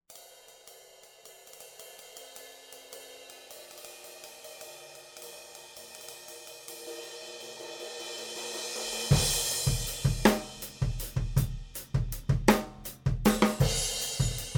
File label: Drum Overheads; Oceanus; mic shootout